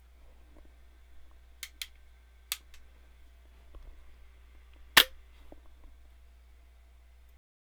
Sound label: Rifle Lock; forlorn